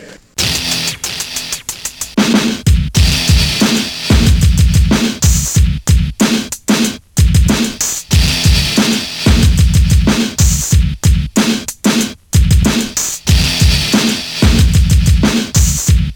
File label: breaks; Super Duck Breaks